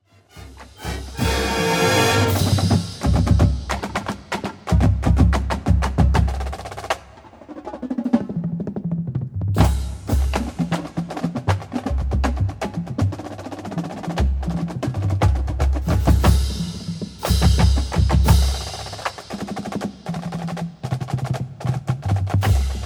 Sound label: Drum Feature, marching band